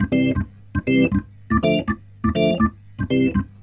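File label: organ, B-3, 80 bpm, roots reggae; Sounds Good - Roots Reggae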